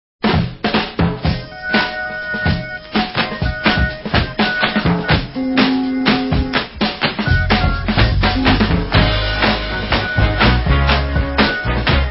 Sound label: Instrumental